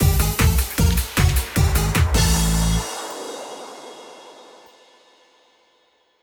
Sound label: lose stinger; Chatter